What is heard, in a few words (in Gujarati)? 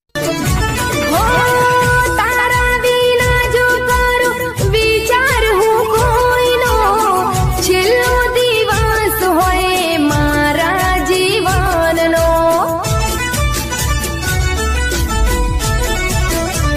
Gujarati Song Ringtone